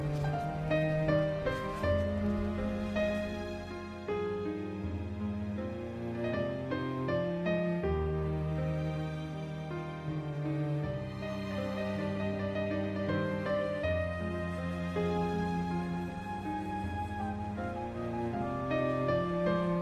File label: Piano